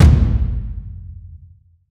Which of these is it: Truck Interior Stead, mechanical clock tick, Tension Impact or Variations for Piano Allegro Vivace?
Tension Impact